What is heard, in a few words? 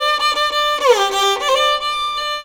VIOLON A, Indian Traditions; USB Soundscan vol.45; AKAI